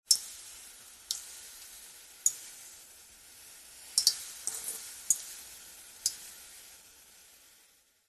foley liquid water drips onto hot metal sizzle burn; zapsplat